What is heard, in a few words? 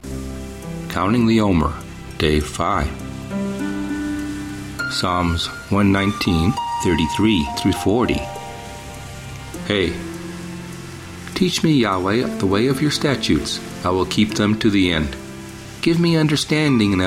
Meditative w Waves Ocean Loop; 60sec